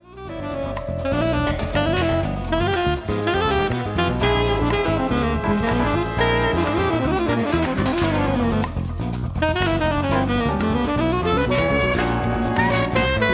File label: Drum sample